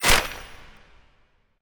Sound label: pounce impact; smite; Arachne